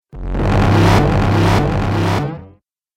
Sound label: Dubstep Audio Laser